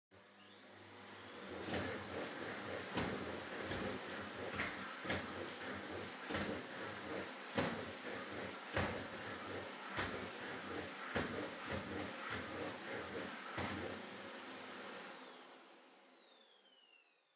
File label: Vacuum Cleaner Far Vacuum Floor Wood High; 掃除機03-66(遠い バキューム 床 木 高)